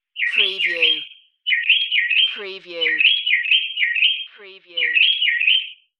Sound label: Mocking Bird Call, bird